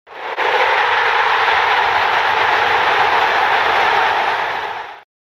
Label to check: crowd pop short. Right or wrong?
Right